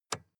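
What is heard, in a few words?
sports car interior lightswitch soft; verkehr transport; autos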